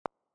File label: LA 852 Hz; Awakening Intuition